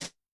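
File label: Brush Tap, Yamaha DTXpress-II snare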